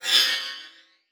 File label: Flash Whoosh; Multimedia Internet CD-Rom Flash